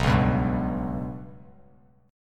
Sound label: B Suspended 2nd Sharp 5th down Bass Standard; Bsus2#5 strummed; JGuitar